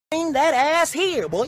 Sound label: Ringtone